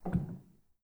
rel, Grand

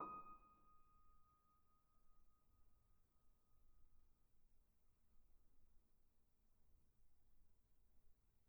D#6, bitKlavierGrand Binaural

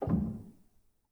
rel, Grand; bitKlavier; Binaural

